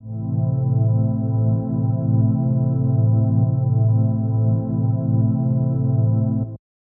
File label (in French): Son centré sur 63 Hz 13 dB